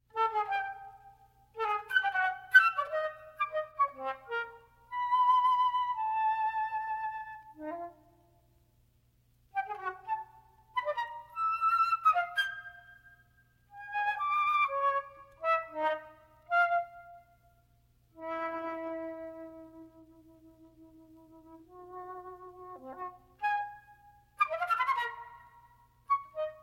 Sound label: Sequenza For Flute solo